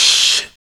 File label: Human Perc